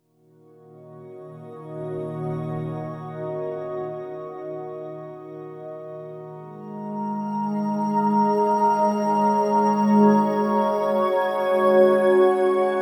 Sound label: Drift Bed, Spektral Minimalism; Sonic Foundry (Sony Creative Software); James Johnson; Complete Beds